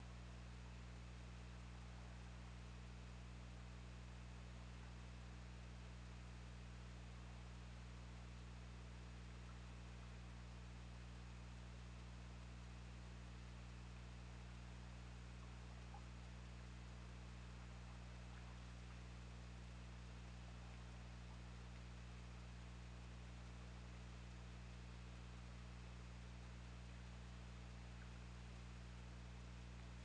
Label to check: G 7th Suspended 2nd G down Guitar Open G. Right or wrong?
Wrong